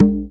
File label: arabic perc, Arabic Percussion Kit